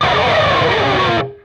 PRS SCRAP, Guitars; PRS SCRAPES

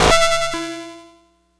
Cwejman S1 Pitched Noise Clap Stepper

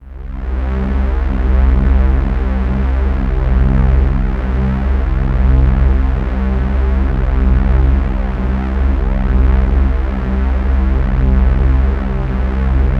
C1, Korg MS-2000; Synthworx

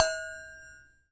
BOWL RING; PERCMETALIC; Rarefaction; Poke in The Ear With A Sharp Stick

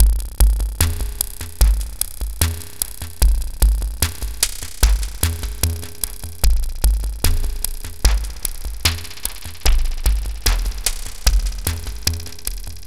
PHASE SHIFT; FRENETIC FRACTAL GROOVES

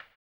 HAMBONE; ILIO; Vocal Planet; Blues & Soul

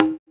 Percussion, House

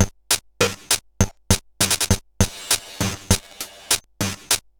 Bent RX17 (100BPM), cyborg beats; musicradar

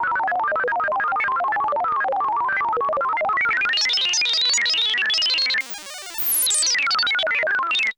SAMP+HOLD, Electrn.perc; AMG; Global Trance Mission VOL-1

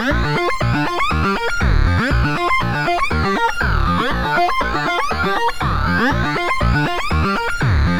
Synth Sequence; Eclectic Electronica

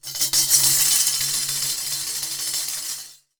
DAF L R SH-R, DARABUKA; WorldSounds

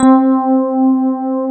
FM ELP C3 R, Keyboards Old School; USB Soundscan vol.09; AKAI

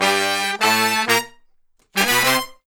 Horn Section; Sonic Foundry (Sony Creative Software); Jazz Solos and Sections

